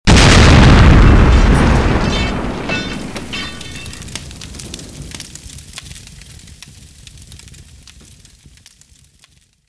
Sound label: fuel explosion